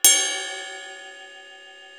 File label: ride bell; East West; Global Sounds